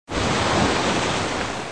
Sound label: Calm Critical Stop, calm seas; Nature